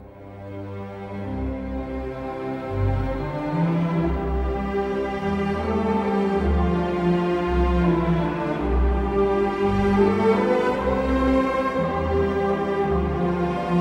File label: waltz; gold and silver waltz